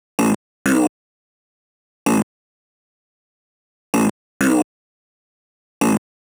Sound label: 128BPM Vox, TechHouse; Averna; Vengeance Samples; Bonus TechHouse Kits